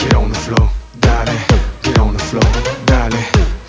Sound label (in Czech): hudba; cstrike